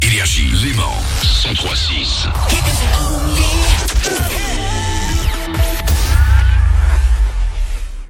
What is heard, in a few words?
JINGLE; LEMAN, 103.6 FM; NRJ